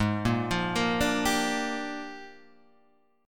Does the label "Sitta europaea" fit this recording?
No